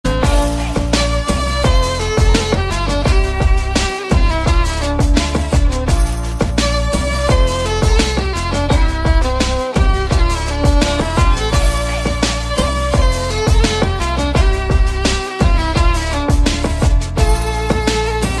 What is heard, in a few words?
best ringtone violin